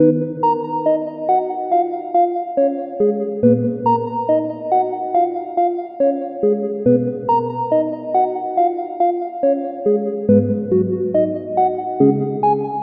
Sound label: melodic loop smooth pluck 140 D